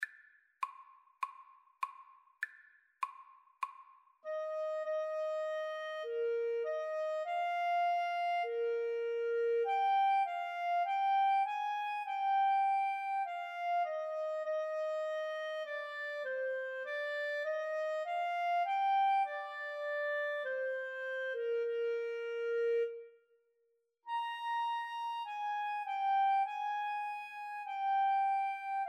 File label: clarinet saxophone duet